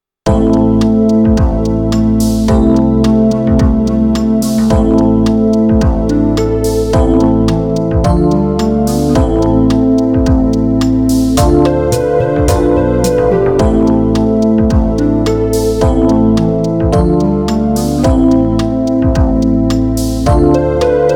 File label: synth band 108